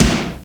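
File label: Fairlight IIx CMI Kick 3; 300 Drum Machines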